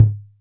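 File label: Roland CR-8000 conga; DRUM MACHINES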